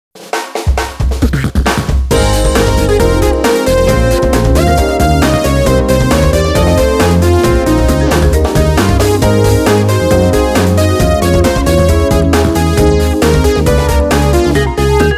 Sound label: Music Success event